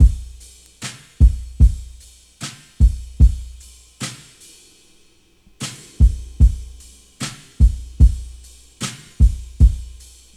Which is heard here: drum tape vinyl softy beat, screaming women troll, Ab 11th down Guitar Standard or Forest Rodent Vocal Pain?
drum tape vinyl softy beat